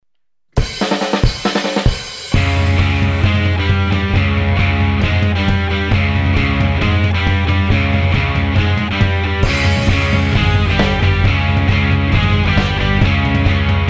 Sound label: drums